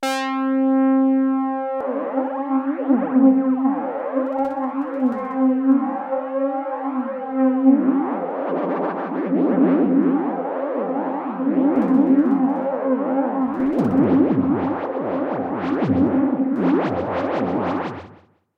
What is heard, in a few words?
fx flanger; Creamware minimax